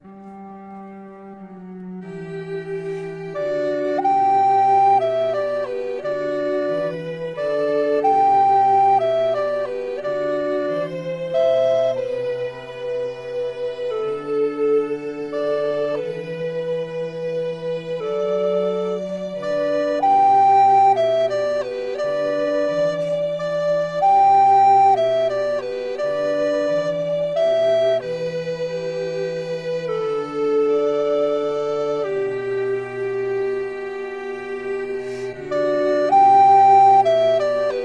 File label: Waltz